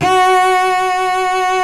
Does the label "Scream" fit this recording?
No